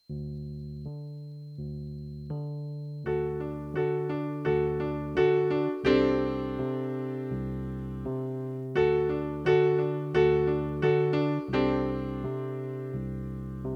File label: acoustic piano